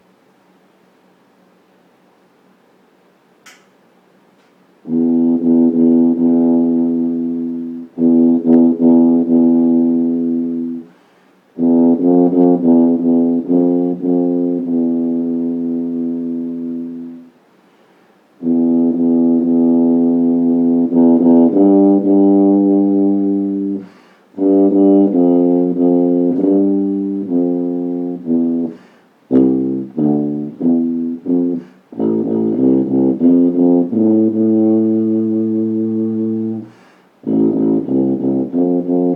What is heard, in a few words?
Kanstul 90 CC tuba; 3 Bells